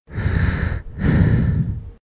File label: gas mask; clothing system